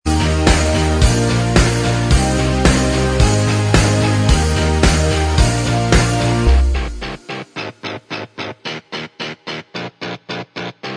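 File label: instrumental, track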